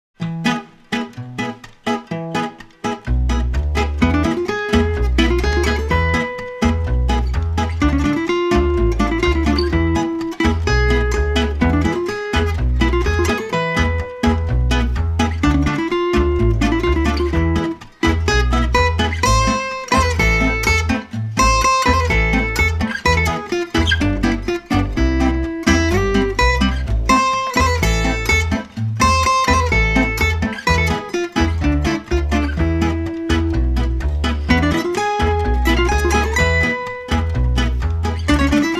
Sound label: Jewish Klezmer